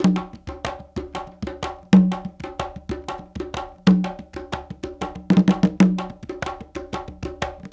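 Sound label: Bembe Latin 6 8; Spectrasonics - Supreme Beats - World Dance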